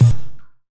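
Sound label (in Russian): удар по металлу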